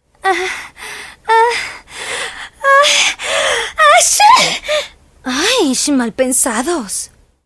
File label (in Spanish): estornudo achis ah ah ah achu